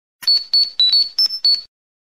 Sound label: attack